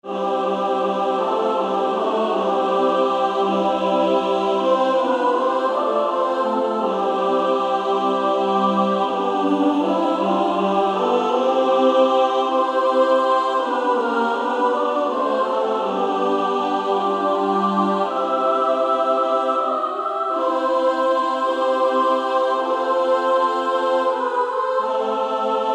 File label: Female Choir; SSAA